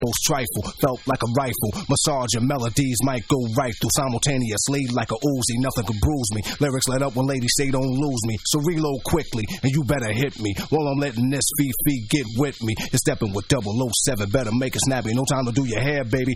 Acapella

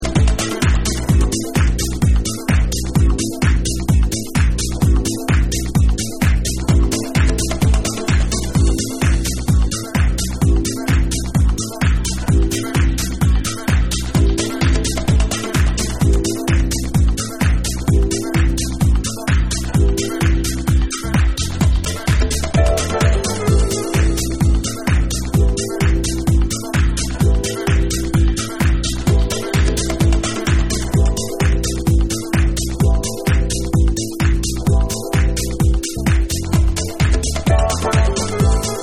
Dub Mix

